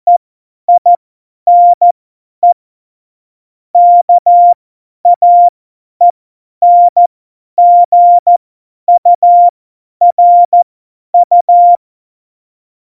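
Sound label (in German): Witz, Morsekurs, cw morsen; Tempo 50 F70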